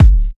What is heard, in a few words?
short bass drum one shot g key, bass drum one shot tuned to the G note; kick drum samples